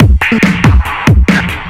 Breakbeat; Vengeance Samples; VEC2 Loops

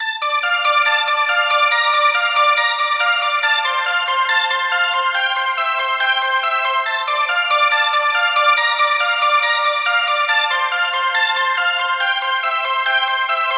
Bells Chorus, 140 BPM; Vengeance Samples; Song Kits; Take Me Away

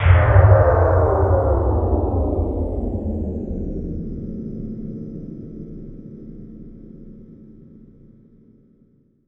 Downlifter

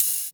Cymbals Ride; Vengeance Samples

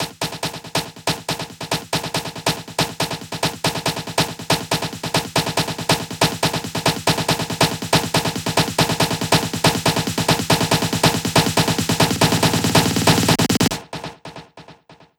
140BPM SNARE 8 Bars; Vengeance Samples; Snares&FX Pairs

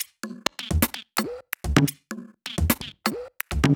Drumloops 128BPM

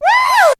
Vocal Oneshots; Vengeance Samples; Vocals

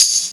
Open Hihats, Cymbals; Vengeance Samples

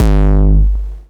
BD Distortion, Bassdrums; Vengeance Samples

